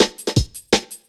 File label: Drum Loops, 165.5; Jungle; Zero-G Jungle Warfare 1, 2 & 3